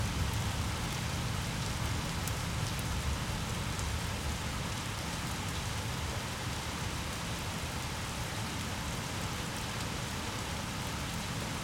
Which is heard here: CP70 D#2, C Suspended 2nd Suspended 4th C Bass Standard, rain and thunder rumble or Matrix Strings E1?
rain and thunder rumble